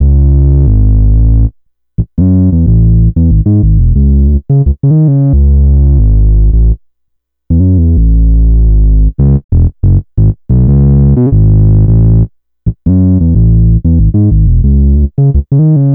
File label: Synth loop 90 C#m, Synth Bass Loops; Slate Digital BASSHOLE Sample Pack